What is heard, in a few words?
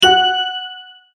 multimedia tone correct; Tones; Sound Design; McKinney Sound